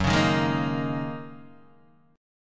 F sharp 6th Add 9th Guitar Open D, chord

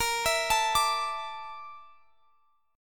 D Suspended 2nd Bb; Dsus2/Bb

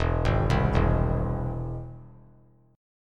E Augmented; E+ arpeggiated; JGuitar

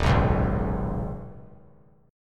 D sharp 7th Suspended 4th Sharp 5th D sharp; strummed; 3,6,4,6; 3C,3F,3A,3D; D#7sus4#5; JGuitar

